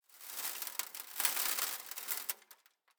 ambient; World of Tanks

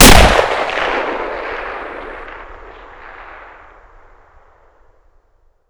Inf Weapons; Red Orchestra 41-45

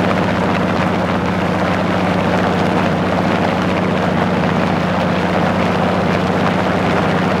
engine plane rpm50 mid; War Thunder

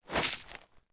Hoe Dirt; Civ4 warlords

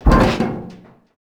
pull sheet metal

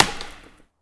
wood distant; Escape from Tarkov

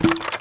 Wood Shield Drop; Civ4 warlords

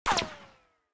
bullet ricochet; War Thunder

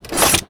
charge

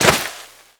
foot sand gritty, footsteps

